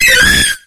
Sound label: cry